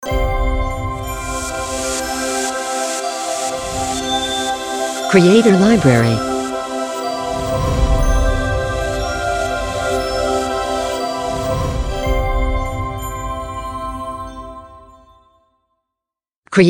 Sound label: Magical Christmas Logo Reveal; Logo-Idents